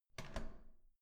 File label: door handle down